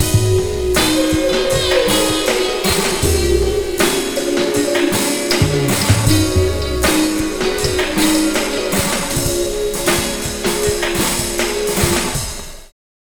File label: Slo Mo Full G#, Lounge, Funk; Zero-G Kocktail Kollection; Construction Kitz (Lounge)